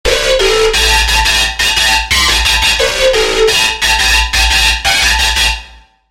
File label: Lead - Chaotic Mess; Routed Sound; Vital Expansion - Chaos Bringer Vol.1